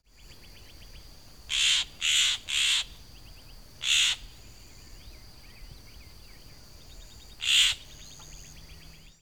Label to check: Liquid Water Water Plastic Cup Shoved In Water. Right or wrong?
Wrong